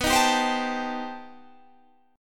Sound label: C Minor Major 13th B